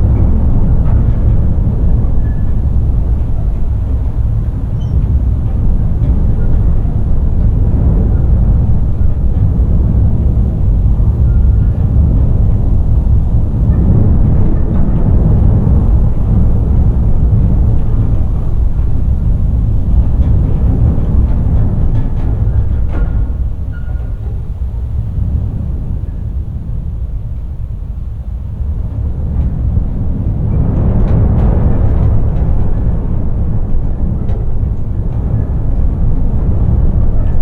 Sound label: Amb Wind loop